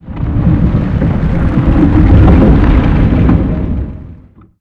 Sfx Colossus Movement

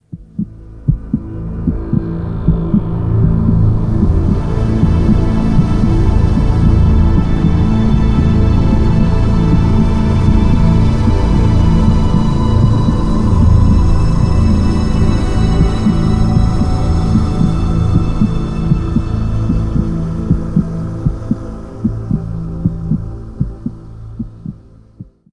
Medical Center; City of Villains soundtrack; Faultline Overbrook Medical Center

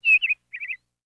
Ambient Sound; Pangya; Fantasy Golf

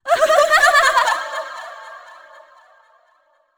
laugh; VO yami

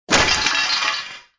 scaffolding break

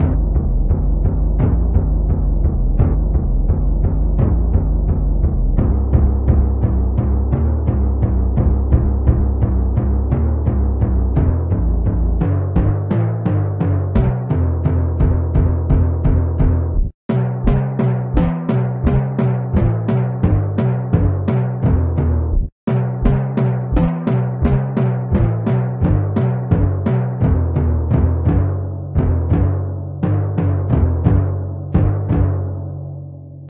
Drum; Sanctuary Score

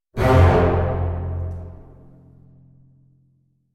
Negative

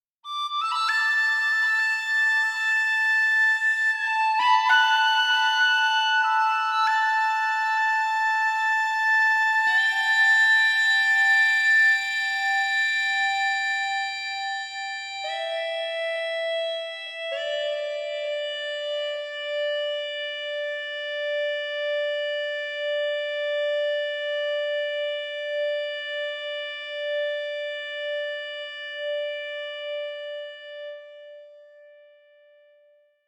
Exploration; The Witcher 3 Wild Hunt; Skellige

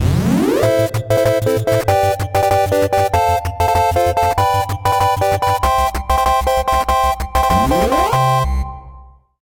Battle win; Sam & Max Save the World Remastered

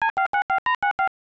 Phone; cobra mission panic in cobra city; ibm pc at

